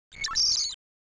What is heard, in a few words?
tone